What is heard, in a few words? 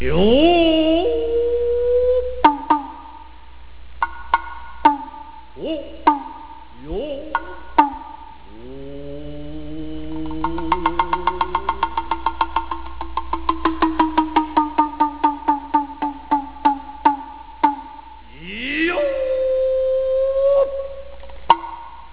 KOTSUZUMI